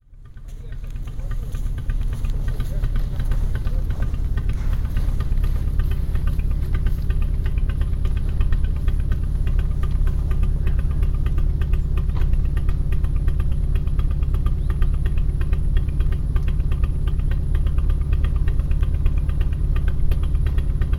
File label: rhythm pipe